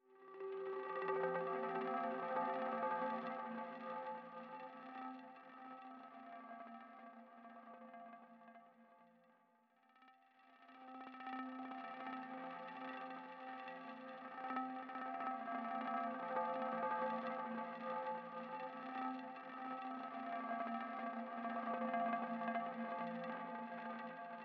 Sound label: synthesizer